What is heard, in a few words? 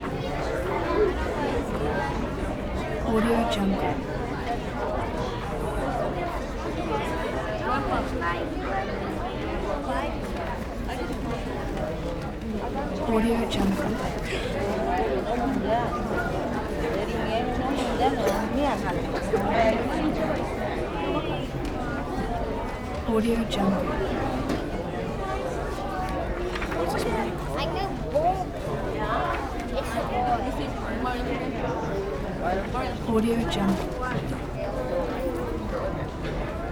crowds theater movie screening audience; preview; آمبیانس جمعیت در حال ت; human sounds